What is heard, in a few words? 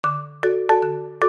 Ringtone